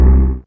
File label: Tom Bass; Fairlight CMI IIe; Humans; Humans1